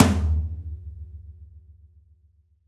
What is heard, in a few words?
Pop Tom, Roland BK-5